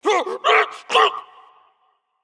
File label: fall